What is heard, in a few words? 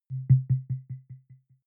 button echo beep resonance; maschinen; signale